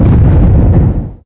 explosions; source engine; hl2; video games

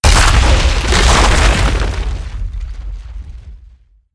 random destruction; Source Engine; Portal 2

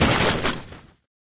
taunt jackhammer loop end; video games; source engine; tf

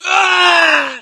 death scream, gambler, survivor voice; source engine; left 4 dead 2

